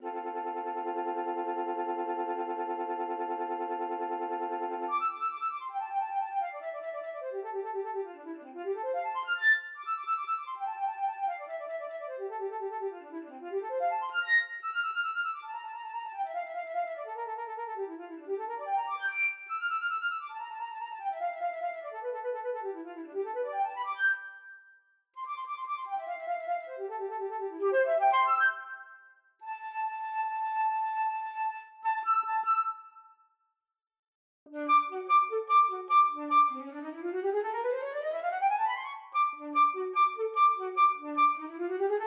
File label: saint saens carnival, flute